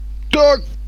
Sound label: dogs short